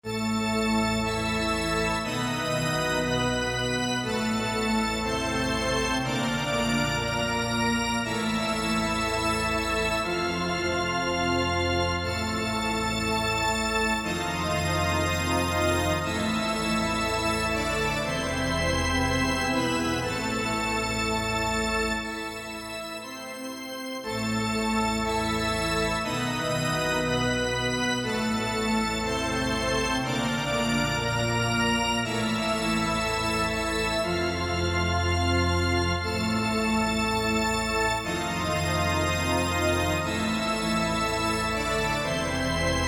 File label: haunted mansion church organ; disney